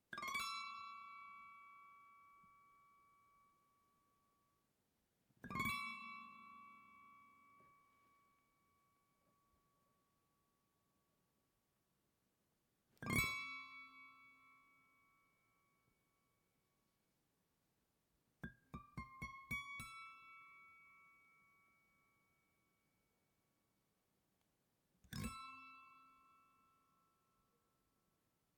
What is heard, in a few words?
Horror Snares